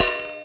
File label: Prepared Drums